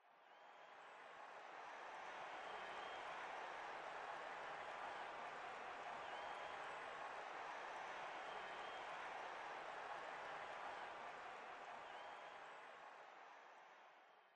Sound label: gas, Pits; NASCAR Thunder 2004